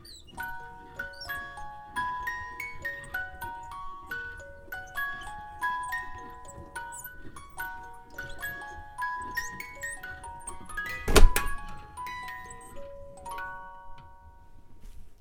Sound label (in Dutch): geluid muziekdoos